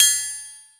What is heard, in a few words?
Metal, Percussive FX; musicradar; cartoon caper